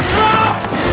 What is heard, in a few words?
sample, male laugh